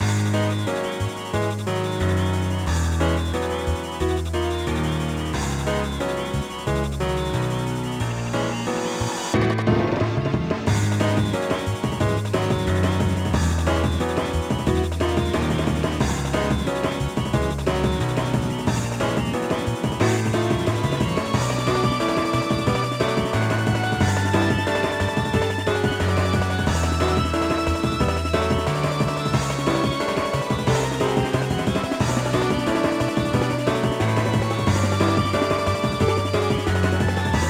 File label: Battle Tower Loop